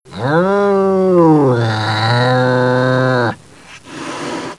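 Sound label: hissing of a cat at the cry of a cow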